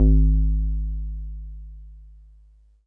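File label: Wood Piano DX100 G#1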